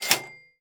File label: cash register down; netent